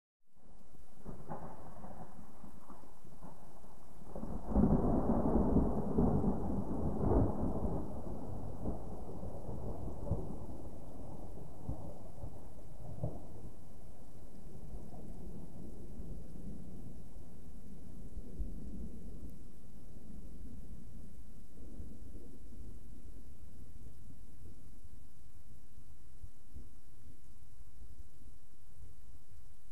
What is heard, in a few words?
Thunder Receding, distant